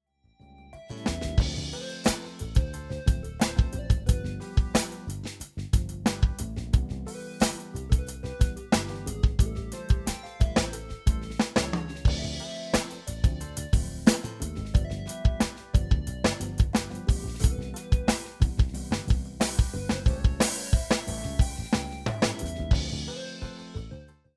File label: Millenium MPS450 Backing